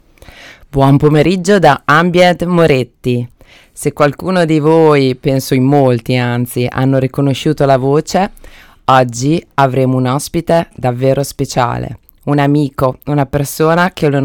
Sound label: ambient